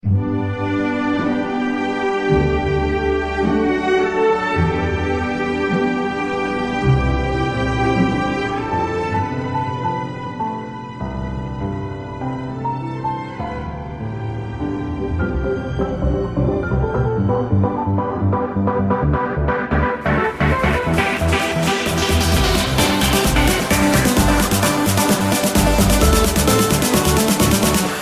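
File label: cool instrumental mix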